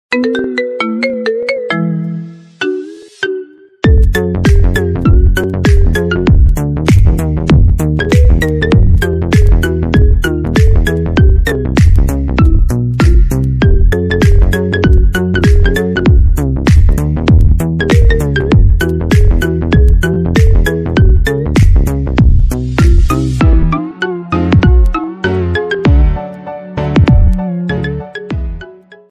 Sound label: Remix Ringtone